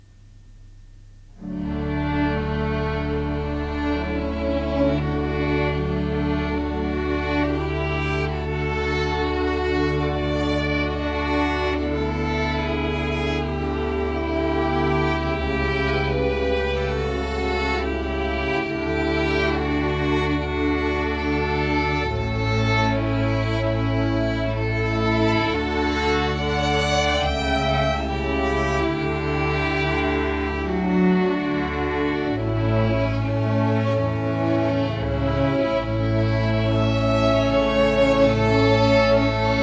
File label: Ave verum instruments only